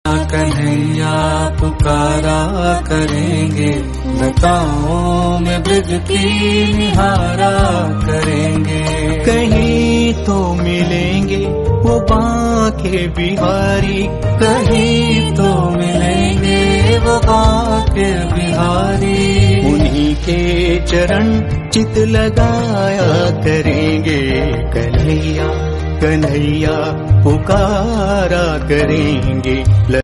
song

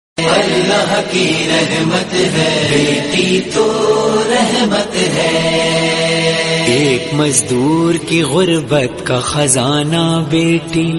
naat islamic

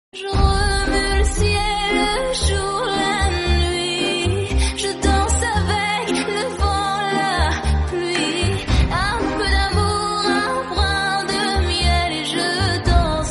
male version

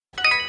love island text

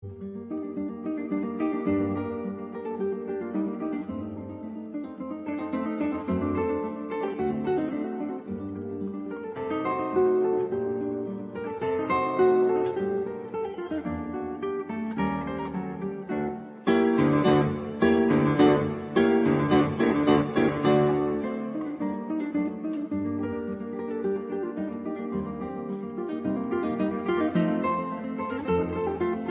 guitar instrumental

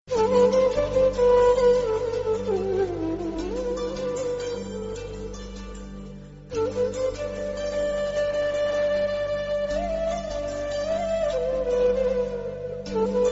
flute; mohabharat

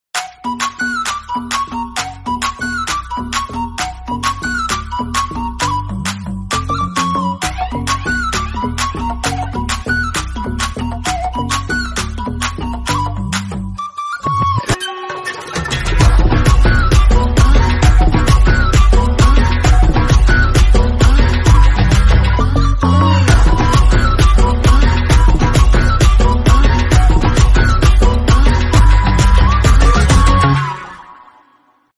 flute bgm instrumental love; jailor

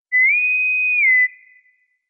turkish whistle tone notification

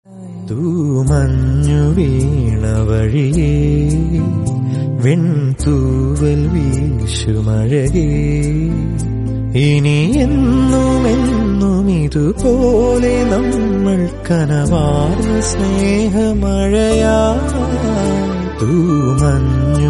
malayalam

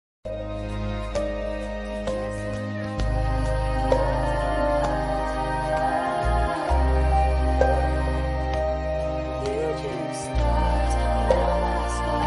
tiktok version instrumental

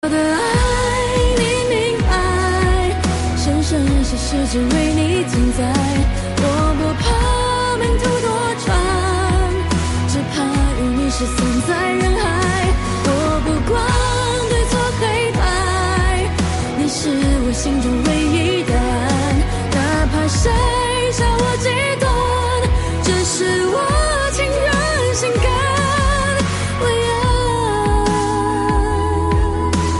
korean song

